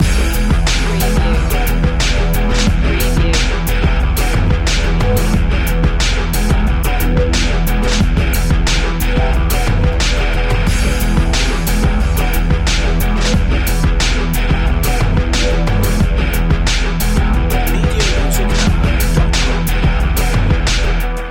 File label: slow rock loop